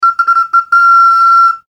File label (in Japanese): スライドホイッスルファンファーレ